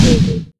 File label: Scifi Drum Snare Wawa; Audiopapkin